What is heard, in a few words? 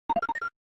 logo sound; taunts; kirazeito pack